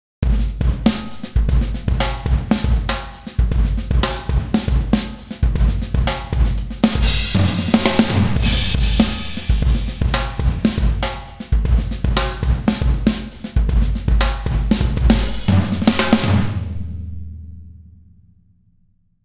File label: over multi comp groove; toontrack